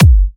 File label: 132BPM Kick; Vengeance Samples; Commerce Kit